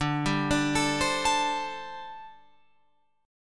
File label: D 7th Suspended 4th, down, Guitar, Open F; D7sus4; arpeggiated